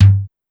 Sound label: Kick, Pitched Up; Clavia DDrum-AT